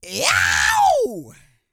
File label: YELL, MALE HUMAN; Spectrasonics Vocal Planet